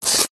food ice cream cone slide pull out from another; zapsplat

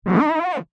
office whiteboard rub fingers 2 squeak

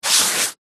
office sponge brush single stroke on paper

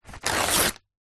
household book page paper rip tear out; zapsplat

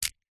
food chocolate small foil wrapped catch in hand; zapsplat

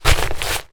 paper sheets many crumpled compress movement; foley; zapsplat

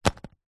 wallet leather drop on hard surface; foley; zapsplat

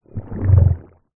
human stomach gurgle hungry or ill

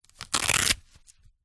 foley wallet open velcro

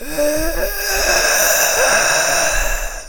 Human Dying Breath; little robot sound factory

